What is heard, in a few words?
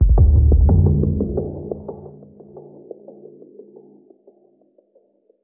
Djembe Granular